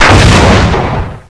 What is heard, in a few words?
nade crunch